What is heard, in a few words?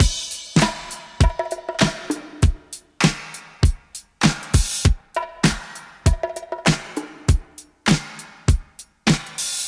99 bpm fresh drum loop sample G sharp key